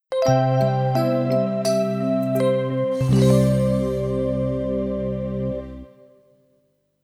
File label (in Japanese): ジングル; tokimeki memorial drama series vol.2 irodori no love song; 「館林見晴登場」